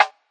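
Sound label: classic hip hop snare; STYLE KITS (Hip Hop, Etc); Classic Hip Hop Mega Kit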